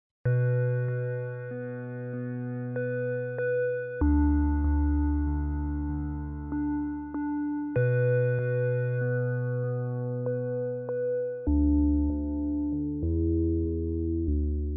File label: Pedal Steel Strum; NordDemo